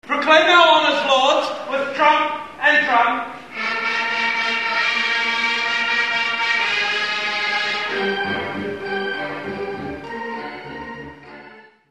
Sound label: trump and drum